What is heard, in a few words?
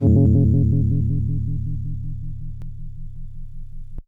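FM Bell FX DX100 D#5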